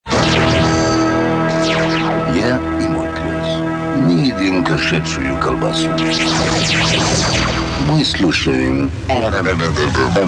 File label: jingle, radio; m radio